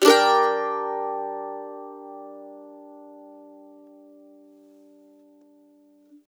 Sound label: CEE CHORDS GM, Blues Guitar Samples